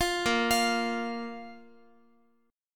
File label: Bb 5th, Guitar; 4E,4A,5D,5G,5C,6F; Bb5 arpeggiated; x,x,x,10,10,12; JGuitar